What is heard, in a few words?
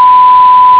1khz